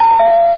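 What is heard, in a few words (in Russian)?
быстрый звонок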